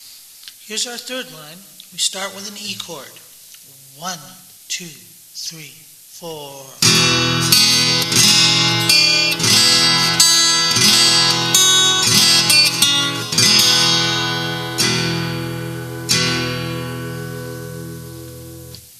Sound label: DRUMMER